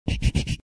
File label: pencil